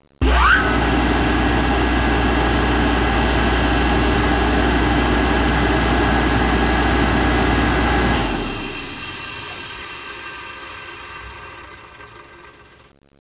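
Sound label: conveyor, factory; ambient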